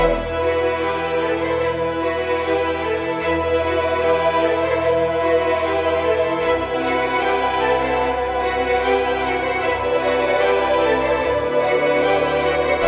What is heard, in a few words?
strings worry; Sad; Loops